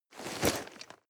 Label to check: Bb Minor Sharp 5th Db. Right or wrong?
Wrong